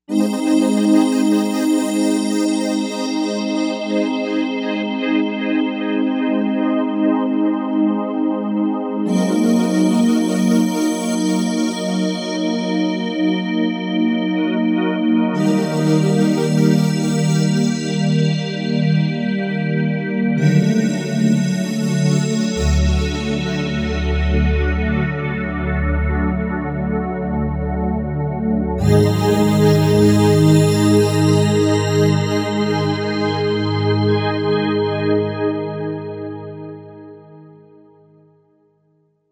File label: SteelPad, Novation UltraNova; Factory Patches